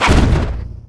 super phys launch

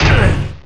fall pain

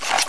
knife holster; firearms

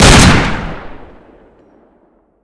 car fire; svencoop; deluge; weapons

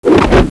flip stand

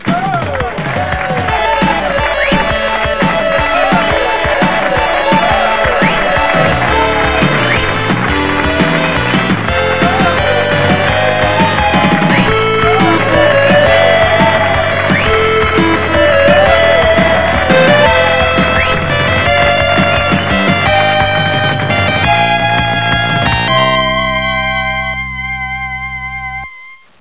victory, music; svencoop

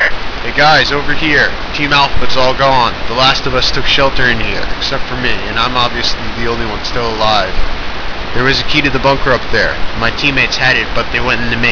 grunt; svencoop; leprechaun

